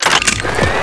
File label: Jump Snd